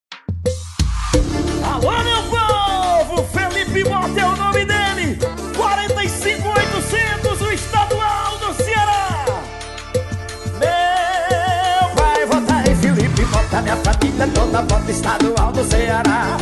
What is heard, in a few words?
jingle